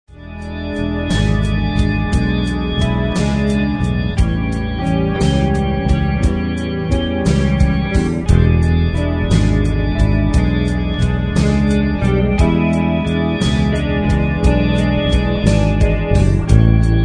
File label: Slow blues